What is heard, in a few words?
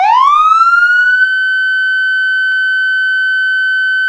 manual, sirens; federal sig ss